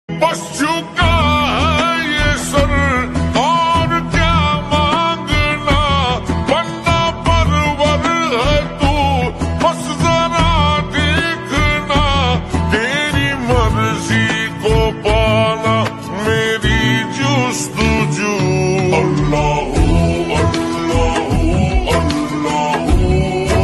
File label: slowed islamic ringtone